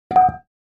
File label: Free Weights Exerci